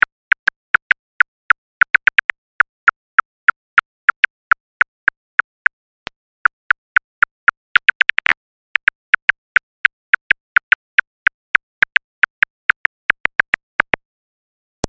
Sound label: Silver Haired Bat Search; Bats (Sound Effects:Animals)